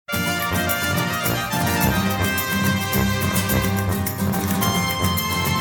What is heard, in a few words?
spanish music